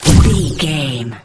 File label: sci-fi weapon blaster laser boom